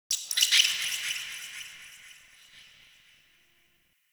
Forest Bats; Warframe; Grineer

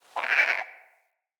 Trapped Beaver Call; Encounters; Animal Rescue

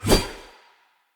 Long Sword Whoosh; Weapons; Player; Swords; Pothia

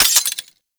Quill Dart Gun Ice Mech

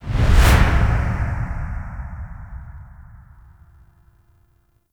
Zoom Whoosh; gameplay; Warframe; NineteenNinetyNine; Stage11FuturePast

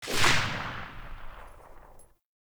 Pistol Tail; Warframe; Weapons; Tenno; Lasrian

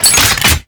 Secondary Mech, Weapons; Kuva Hek

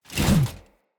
Mace Slap Whoosh C; Weapons; Enemy; Blunt; Sinecure Two Handed Mace

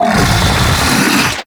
Rock Elemental Cast; Warframe; Brawler

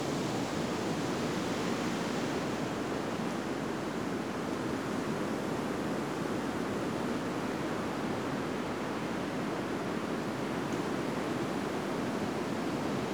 Forest Exterior Crevice Air Amb; Warframe; Ambience; Grineer Forest

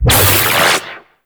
Radial Aura; Amalgam Carrus Pilot; Abilities

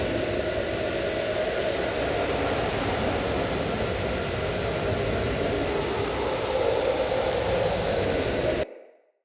Crypt Wind Trap Loop; Champion Crypt

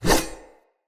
Saw Dagger Whoosh; Weapons; Player; Swords

